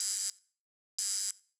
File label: alarm; SFX Daruma